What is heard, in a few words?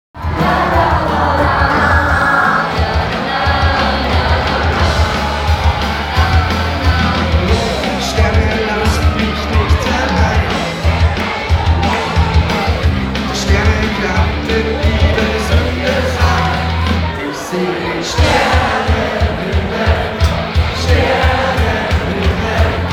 oktoberfest hall deutscher lied; Field Recordings; octoberfest